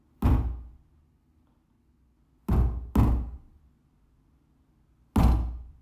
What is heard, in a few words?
Thump Bang